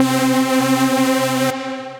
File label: Big Room Superglide, Synth Lead